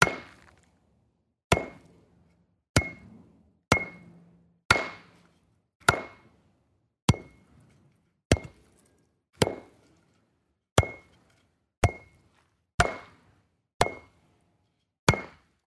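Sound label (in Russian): бейсбол перкуссия бейсбольная бита ching бейсбол спортивные звуковые эффекты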